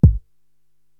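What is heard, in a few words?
bass drum Euro House, Korg TR-Rack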